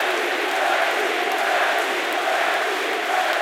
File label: reel spin crowd; superstriker